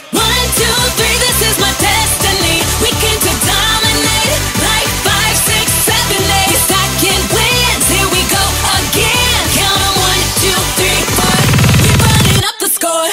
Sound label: Count Track; Twisted Cutz